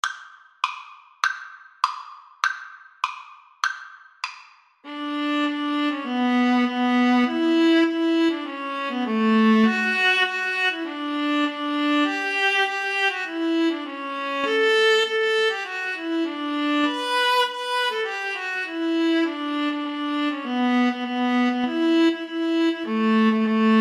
Viola Cello Duet

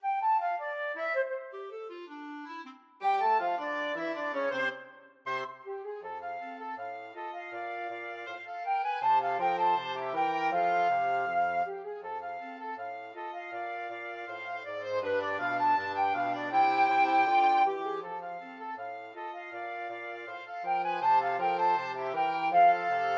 flexible mixed ensemble, 4 players

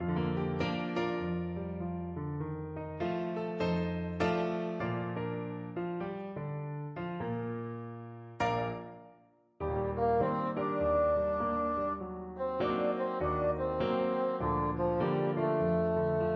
bassoon

